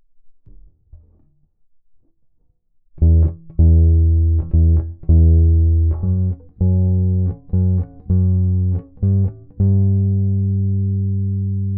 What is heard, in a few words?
bass bridge